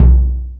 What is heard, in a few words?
FX Sounds, Drum Machines E-MU Pro-Cussion